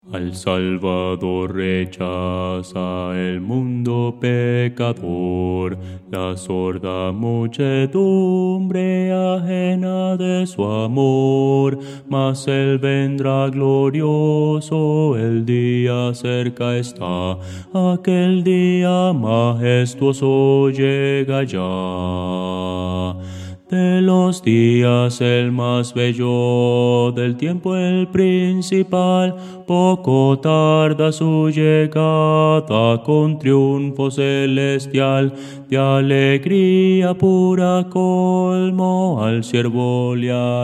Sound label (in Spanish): bajo